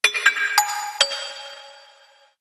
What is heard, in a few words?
Reverberant Metal, phonetones, Motorola; Karma-QA1